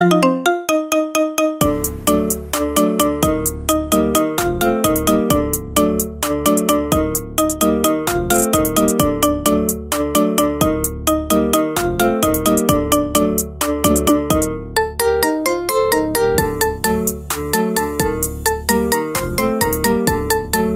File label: iPhone 12 Pro Max Lalala, tono de llamada